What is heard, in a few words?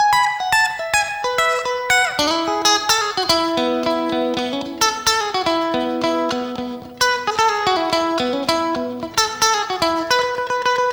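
TOWNSHIP, S. AFR. GTR; Spectrasonics; Heart of Africa 2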